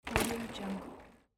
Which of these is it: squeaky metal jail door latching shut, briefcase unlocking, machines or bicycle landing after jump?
bicycle landing after jump